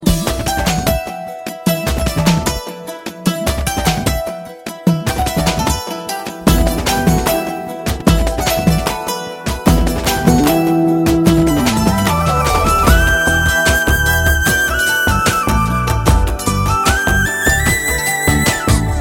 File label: Santoor Flute